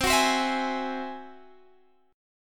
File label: G sharp Augmented 7th C